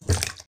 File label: slime walk; Adventures in Lestoria; action RPG game; Sig Productions